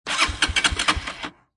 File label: Motorcycle Dirt Bike Engine Turning Over; Auto, Car, Motorcycle, Motorbike: Motor turning over; Lynne Music